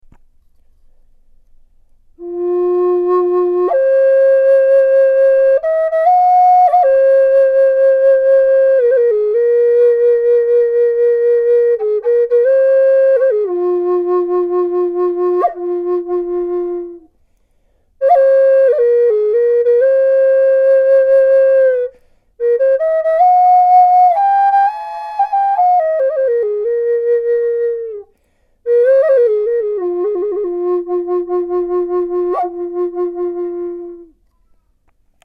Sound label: Walnut Three Rings F432, flutes